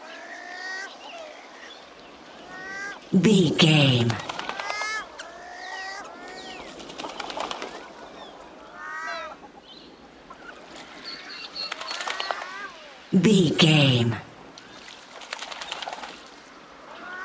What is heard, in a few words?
ducks birds lake ambience low wind loop